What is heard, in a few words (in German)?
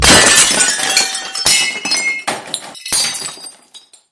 Porzellan zerbricht